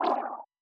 Vanish

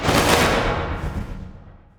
Stag Die

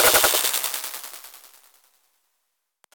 Invunerable Sfx

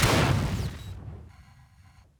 Bolter Attack

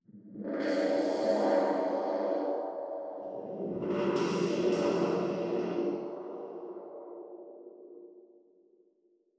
Stag enter

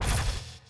Flyer Shot

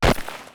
Dig layer

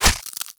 impact Projectile

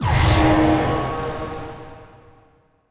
Driller Die

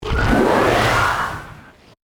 Breeder

